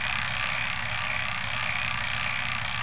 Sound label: BIKE SOUND; Cycling Manager Demo